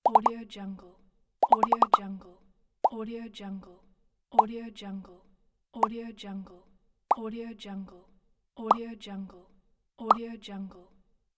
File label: pop